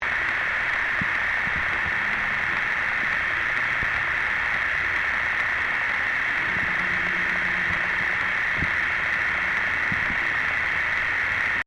PUMP ENGINE UNDERWATER LOOP, Fountain pump operating underwater; Machinery; Machines